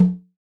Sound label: Roland Juno D Perc Menu Kit